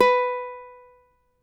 CAVAQUINHO B, Guitars

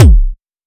HARAM KICK

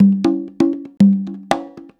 120 BONGO; Best Service Advanced Media Trax Modern Composer

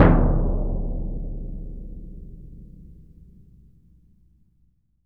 BS DRM MF; ILIO; Synclavier Percussion 2; World & Orchestral

